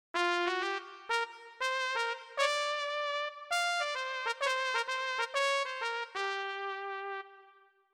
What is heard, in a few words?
Sweet Trumpet